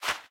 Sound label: menu appear